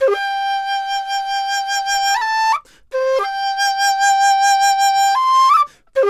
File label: DIZU, 90; INIS - Opium